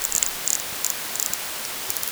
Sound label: pond